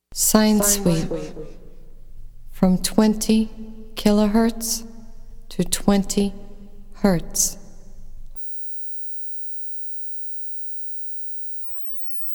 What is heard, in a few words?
20 kHz to 20 Hz